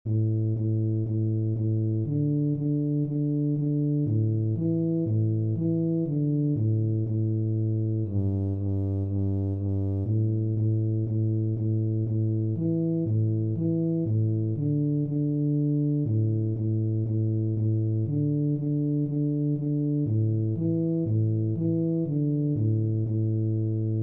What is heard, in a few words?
Euphonium; Tetris